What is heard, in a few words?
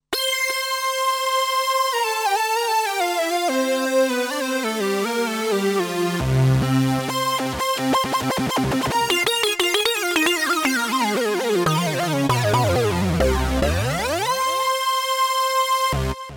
Hyper Lead; Cobalt8